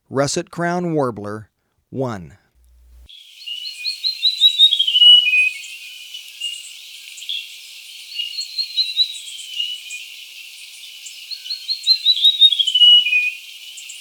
Russet-crowned Warbler, Birds of Ecuador; S. America

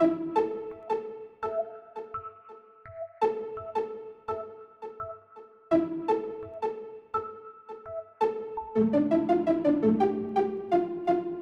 Kokan Bounce, Hip-Hop; Sound Effect Library

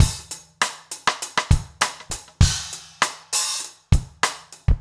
basic funk 100 rims

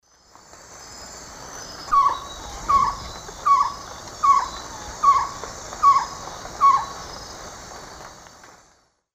Glaucidium brasilianum, song; S. America; Eastern Lowlands

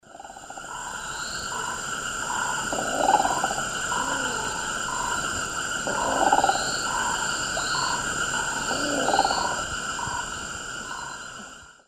Mesembrinibis cayennensis, calls; S. America; Eastern Lowlands

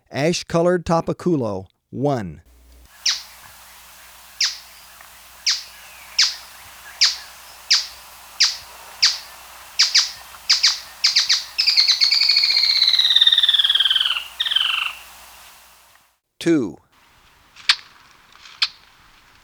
Ash-Colored Tapaculo, Birds of Ecuador; S. America

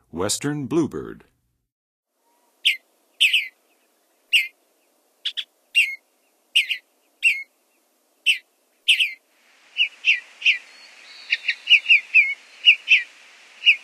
Western Bluebird, Songs of Birds; North America; Western USA; Western USA Songbirds

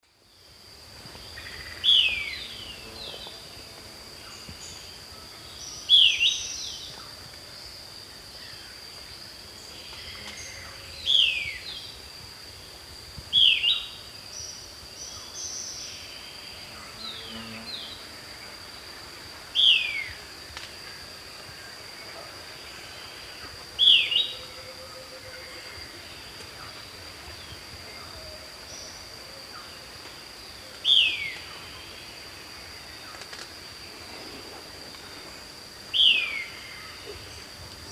Lathrotriccus euleri, dawn song; S. America; Eastern Lowlands

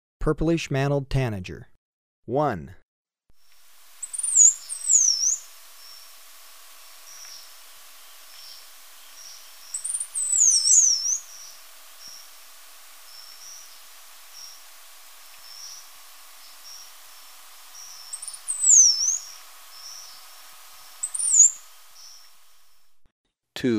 Purplish-mantled Tanager, Birds of Ecuador; S. America

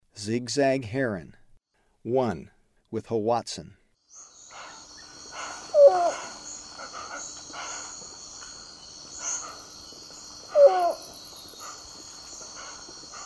Zigzag Heron, Birds of Ecuador; S. America

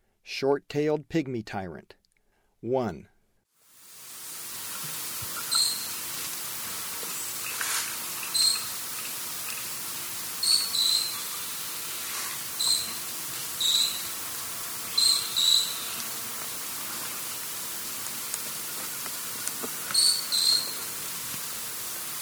Short-tailed Pygmy-Tyrant; S. America; Eastern Lowlands

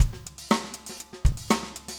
funk 120 bpm, loops

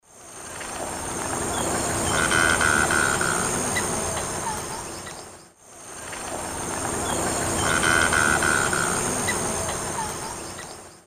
Anhinga anhinga, call; S. America; Eastern Lowlands

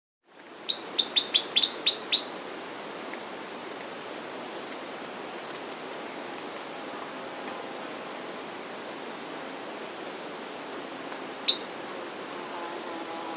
Mottle-Cheeked Tyrannulet, S. America

